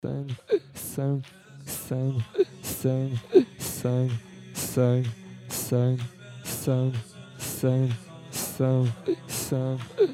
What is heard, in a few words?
Music to practice the singer's voice